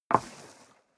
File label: step leather shoes wood parquet slide long; schritte